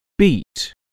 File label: beat